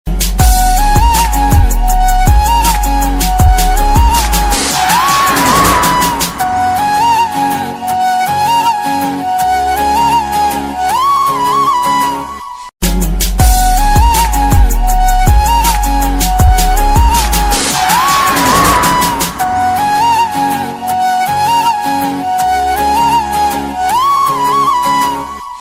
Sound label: Instrumental Ringtone